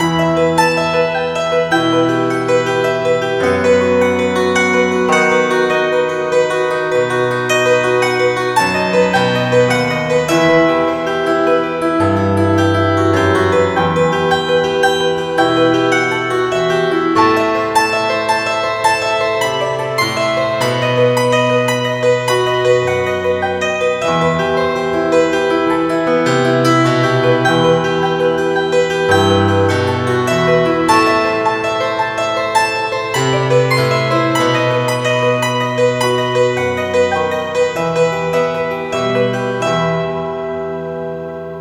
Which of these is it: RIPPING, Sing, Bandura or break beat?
Bandura